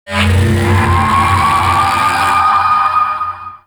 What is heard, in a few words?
Spin Beam Charge; Enemies; Weapons; Warframe; Tau; Sentient Dactolyst